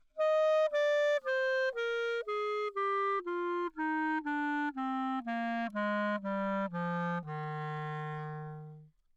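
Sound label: descending 2 octaves, romantic clarinet bb, Anechoic; OPENAIR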